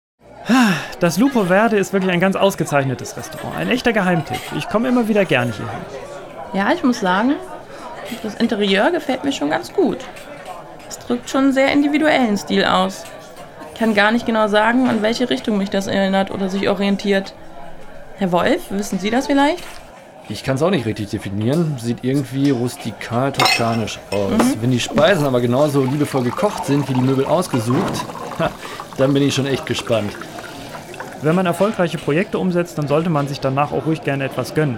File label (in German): szenario restaurant